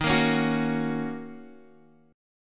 D sharp Minor 7th D sharp, down, Guitar; D#m7; strummed; 4F,4A,5C,5G,5C,6E; chord shape

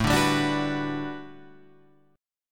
G Major 9th, A; GM9/A strummed; JGuitar

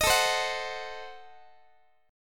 A Diminished 7th A down Guitar; strummed; Adim7; x,x,18,19,16,17

